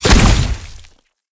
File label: explode; smite; ahpuch skin10